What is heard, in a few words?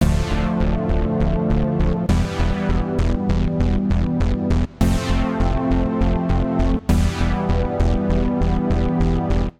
SynBrass (Dm) 100 bpm; Music Loops; Kershaw; Ableton; Singularities